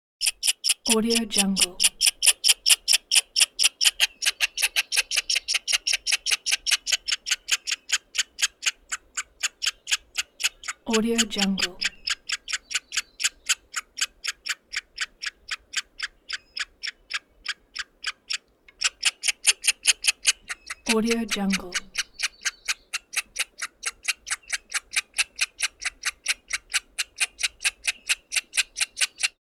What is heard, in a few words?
squirrel chirps, Kaibab; hollywoodedge